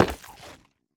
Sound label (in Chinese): 下界矿石踩踏声